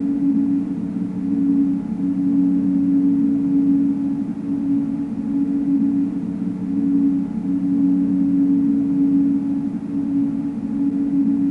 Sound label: Drone; Drones